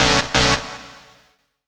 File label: Synth, Techno